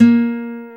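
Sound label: GUI NOTE, acoustic guitar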